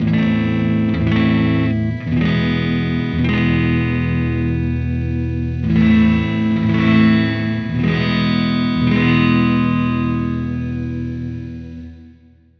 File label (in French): effet reverb